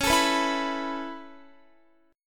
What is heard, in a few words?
C sharp Minor 7th Sharp 5th C sharp Guitar; C#m7#5 strummed